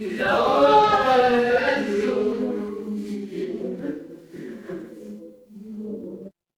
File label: crowd murmur